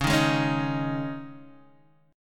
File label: C sharp Suspended 2nd C sharp Guitar Open D, chord; x,4,1,2,4,1